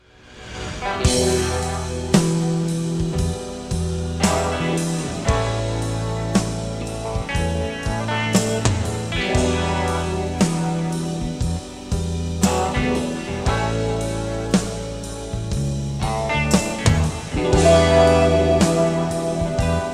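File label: Intro, guitar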